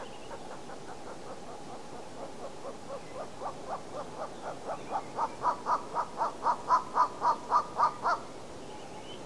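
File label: golden eagle aquila chrysaetos; orla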